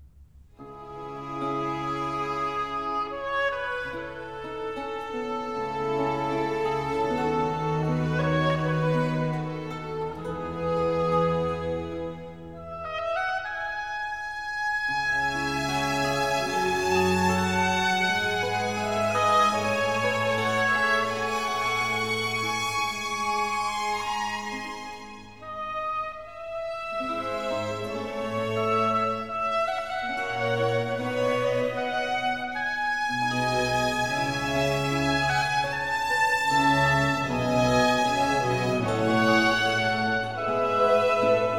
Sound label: Concerto Grosso in D minor, Adagio; Georg Friedrich Händel; English Baroque Soloists, Sir John Eliot Gardiner; Concerti Grossi, Op. 3